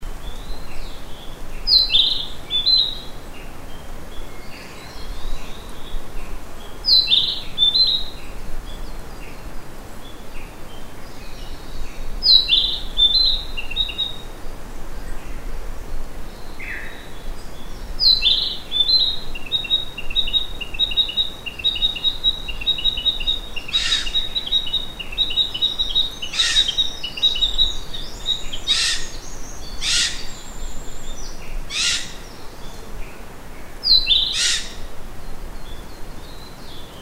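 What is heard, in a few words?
kibitaki, narcissus flycatcher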